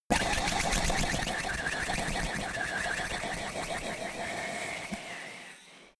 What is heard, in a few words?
lips smacking long; comedy-cartoon; koerpergeraeusche